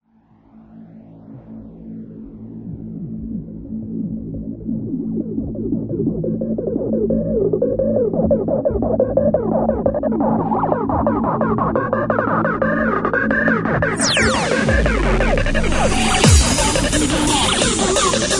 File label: acid turbo; happy hardcore